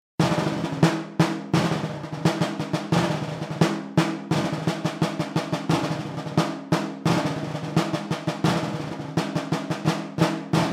caixa

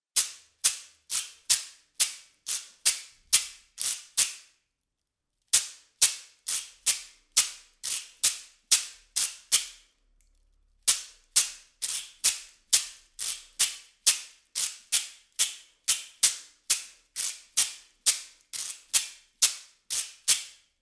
pinhas

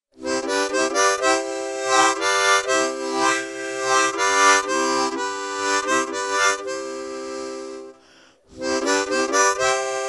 filharmonica

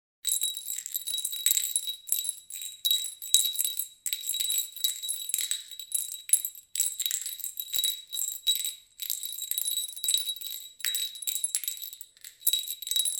axouxere

